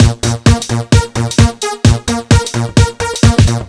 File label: 130 No Snr, 130bpm; Nu Dance Electro; Zero-G; Soundclash; Combi Loops